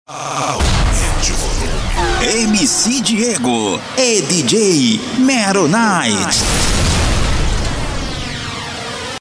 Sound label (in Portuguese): abertura